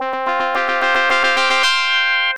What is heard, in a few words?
Fanfare Jingle